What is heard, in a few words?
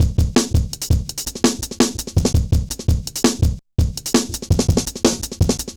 Jazz Shuffle, Drum Loops